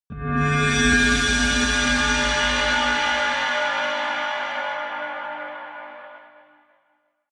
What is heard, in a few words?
ring flutter high